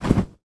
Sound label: foley blanket shake; sound effects one